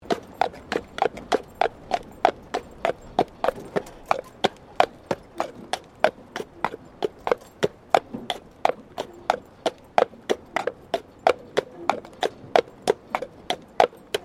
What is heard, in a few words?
Tram Horse Drawn Sequence Horse Isolated Pavement; Horse drawn tramcar; Carriages; Vehicles